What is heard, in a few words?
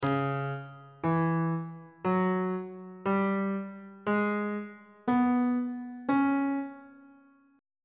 c sharp blues scale on bass clef